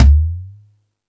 Thundadome, E-MU Pro-Cussion

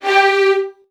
G2, VN 16TH, Virtuoso Strings; ILIO; Kirk Hunter

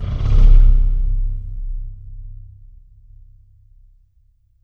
Pipe Rub; Effects

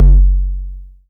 kick, Korg ER-1; 300 Drum Machines; Kit 6

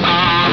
El guitar, Amiga Soundtracker; ST-XX Amiga Soundtracker Sample Packs

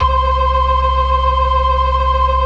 BLOOD OCT, Synth and Organ

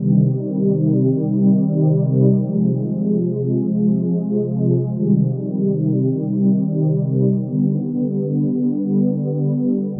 Ocean Pad; Pads; Dr. Fink's Funk Factory

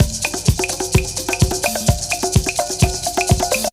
break; Zero G - Man Machine

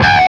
HARMONC DRAG, Guitar

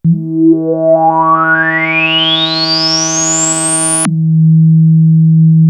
ARP 2600 D#3, synthesizer; Hollywood Edge - Giorgio Moroder Rare Synthesizer Collection

